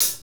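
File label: hi-hat, Techno Rock; Northstar; Drumscapes Roland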